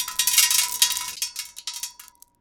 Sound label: Gravel Gentle Spilling On Metal